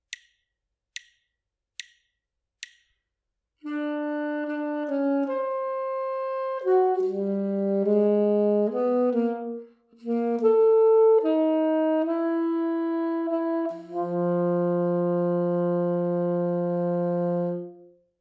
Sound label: melodic dictation; ear training